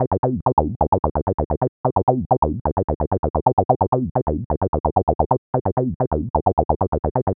130 Cm bass, bass loops, trance; Trance Explosion Vol1

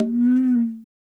Medium Conga Bend; Sonic Foundry (Sony Creative Software); Joe Vitale Latin Percussion

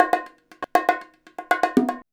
119 bongo

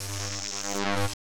ARC SEQ, ELECT NRG; Total Control - Action Cutz

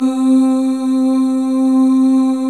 Male Ooz Az; 90s Sample CDs; Club-50 - Foundations Roland

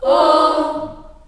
D#3 child AH, choir; CHILD AHS

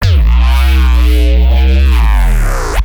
Reese; neuro; BIG5AUDIO

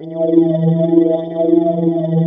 Korg, FX

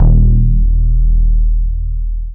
Undistorted Bass; AMG - Prototype Drum & Bass

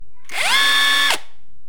fooley; M8; percussion and foley

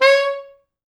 C#4, Pop Brass

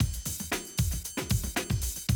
Classic Drum Loops

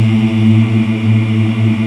VOX African; Roland LCDP11 Africa VOL-1

